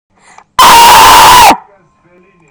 scream

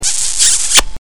kisss

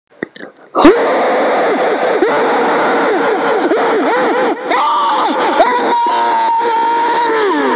microphone scream

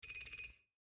tingle

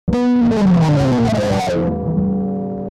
scratch

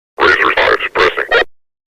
suppressing

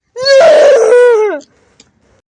scream

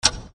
timer tick; squid game

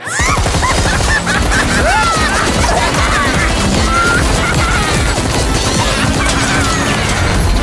tommy gun; gangles

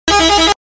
fast horn

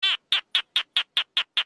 hehehe

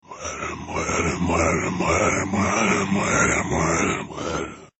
zombie alert horde

